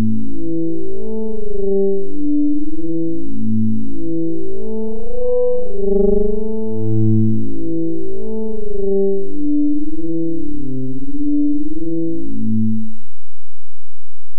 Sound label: fifths melody